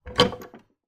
clock grandfather door open

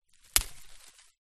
impact twig stick break snap; zapsplat

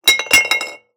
Wine Bottle Empty Knock Over Concrete

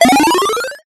multimedia game sound classic 1 up

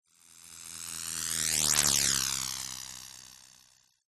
cartoon plane prop high pitched pass by

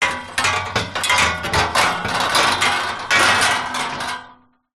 metal frame bed loose hit rattle; impacts

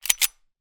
gun 1911; weapon; still north media

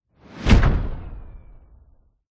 sound design cinematic hit slam powerful

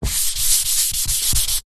office chalkboard eraser duster rub

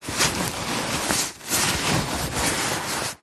luggage bag rucksack handling movement; zapsplat

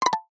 multimedia ui tone short plucked nylon descend

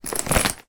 foley laptop case pick up; sound effects one

